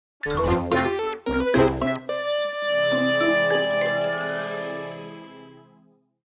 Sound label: Defeat; Pikmin 4